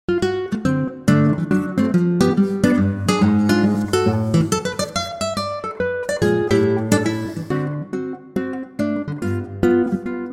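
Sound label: Acoustic Guitar D; key - D - vocal range - A to A; Superb acoustic guitar arrangement of this classic blues standard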